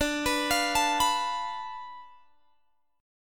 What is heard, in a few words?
D Minor 6th Guitar Open G